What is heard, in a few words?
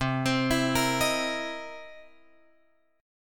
C 7th Sharp 9th C